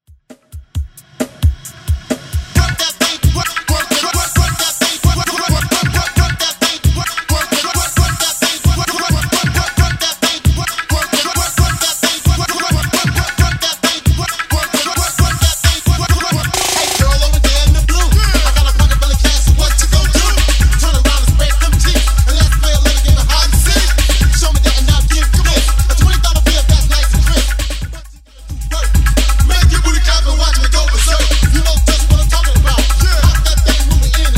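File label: 90s Old School DJ Intro Outro Redrum 133bpm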